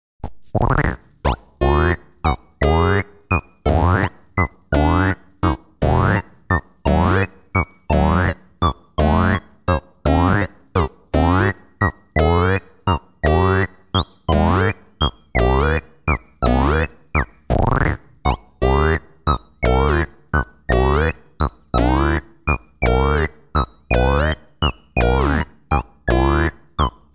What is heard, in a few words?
frog waltz